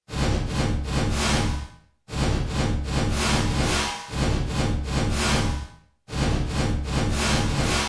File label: Drum Full Reverse Reverb